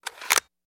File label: Camera Wind Click; shutters & movement